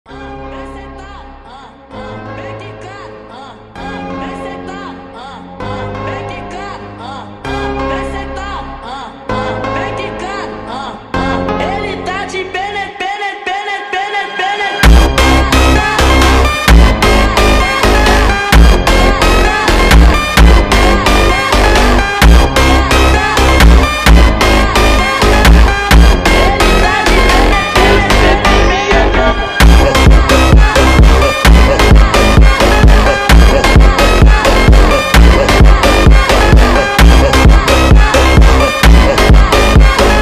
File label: Phonk